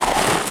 Sand footstep

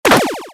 Object Button Stick; starbites

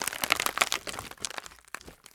Foley Crunch Grind Rock Salt; Frogsong

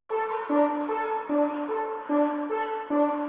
scene brass intro

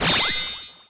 minigame countdown start; MiniGames

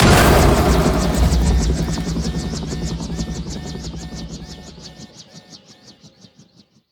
Enemy Army Helicopter Attacked

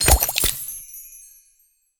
Calendar Intro Noise; Clawee

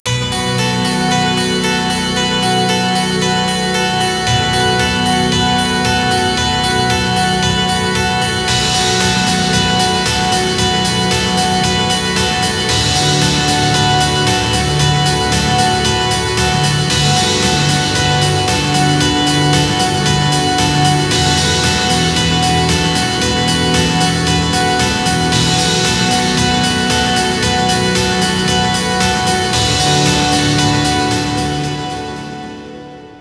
Str G#

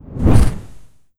Whoosh Magic Spell; Legend of Keepers

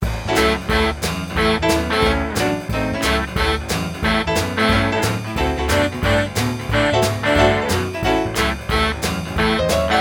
Title Bowling; Flick Sports: Bowling

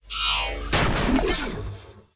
Final Boss Phase Swap; unsighted; enemies

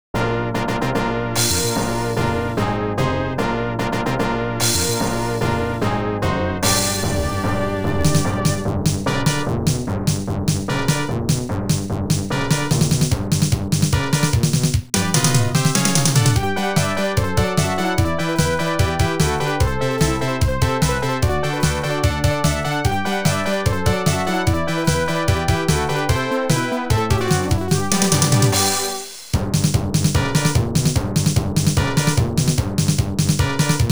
Loading

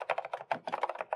Friend-Typing

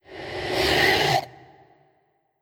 Scream; Necro S Kell Scream

